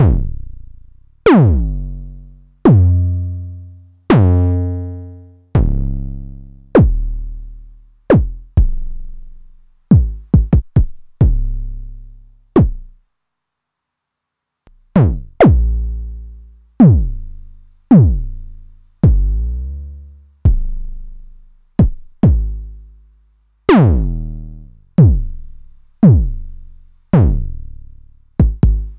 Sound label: 909 mod BD - BASS DRUM